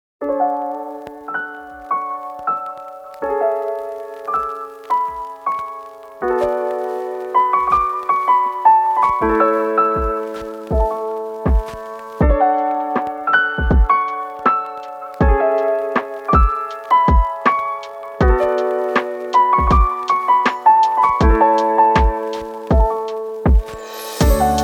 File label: chill lofi song